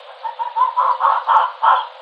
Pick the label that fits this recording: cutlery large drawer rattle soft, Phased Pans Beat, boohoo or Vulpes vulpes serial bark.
Vulpes vulpes serial bark